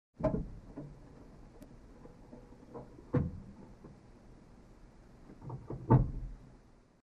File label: Car doors closing